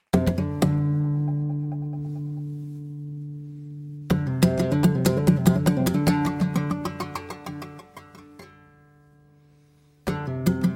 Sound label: Berimbau